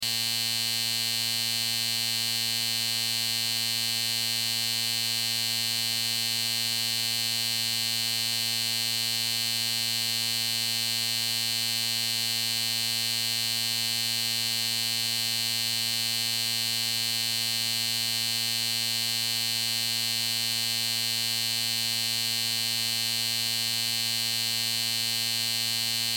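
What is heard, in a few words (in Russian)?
звуки машинки для стрижки волос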